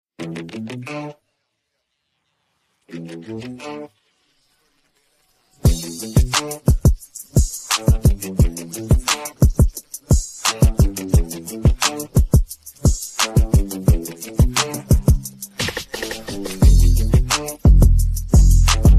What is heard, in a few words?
Instrumental